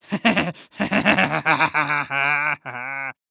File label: Cartoon Human Male Laugh Evil Troll Laugh